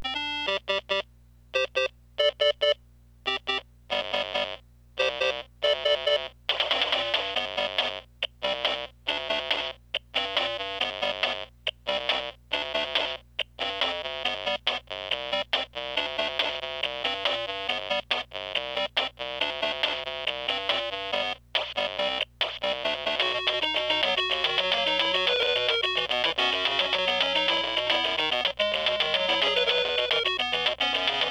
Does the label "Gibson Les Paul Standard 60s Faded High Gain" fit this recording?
No